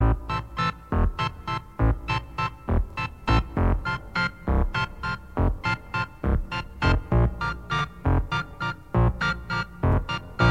1800s Fairground

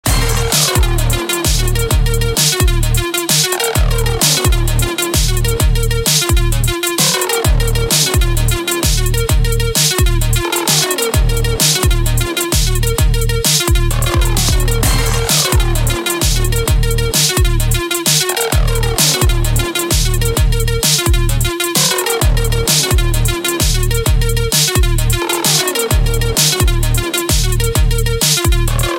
Crunk Remix

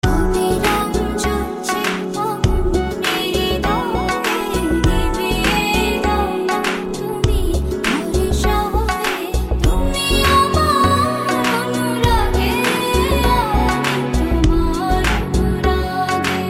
Melodies Bangla

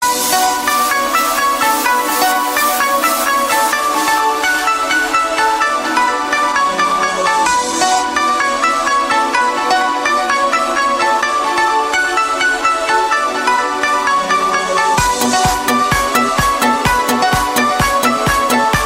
house mix HIT

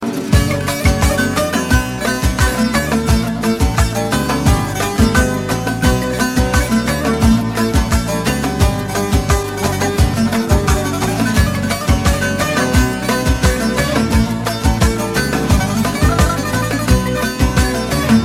Turkish Music

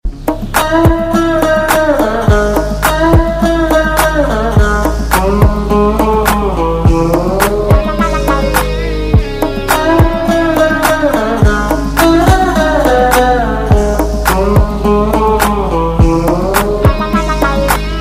Kick

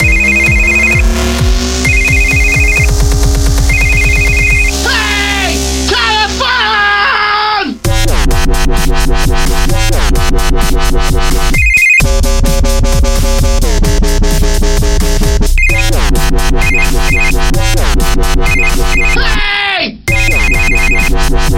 Dubstep Rintone

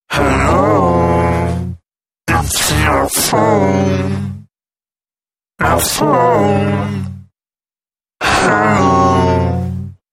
Ghosts

